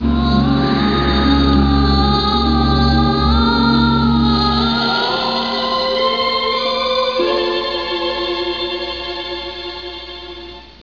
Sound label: ahhh